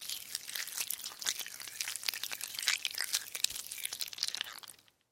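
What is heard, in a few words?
sounds of worms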